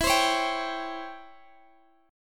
Db Minor Major 7th Flat 5th E

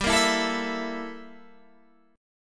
G Minor 6th Add 9th G down Guitar Open G

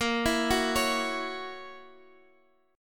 Gb 7th Bb, chord

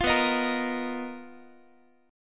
B Major 7th Flat 5th B down Guitar; strummed

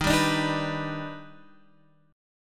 Eb Augmented Major 7th Eb down Guitar Standard; Eb+M7 strummed; JGuitar

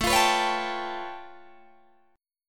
A Augmented 9th A Guitar Open D

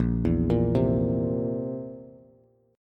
A sharp Suspended 2nd Flat 5th A sharp, Bass

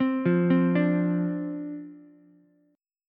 D-Minor 7th, D, Ukulele; Dm7; arpeggiated

